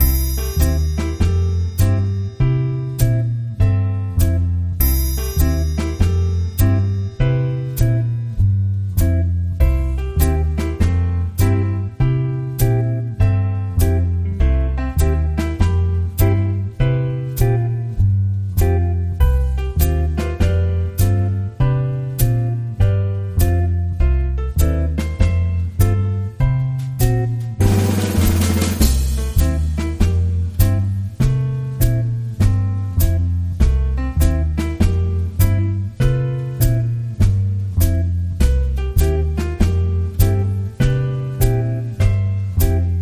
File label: Mainly Piano